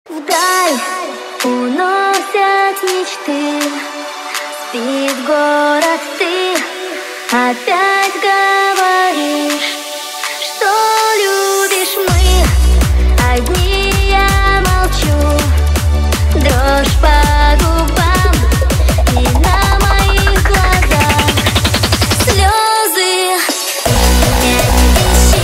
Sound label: hardstyle remix